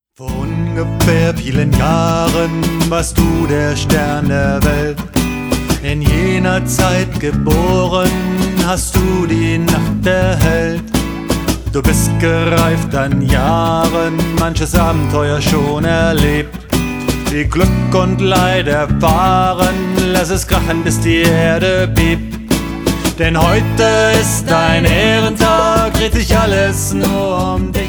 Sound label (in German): Geburtstagslied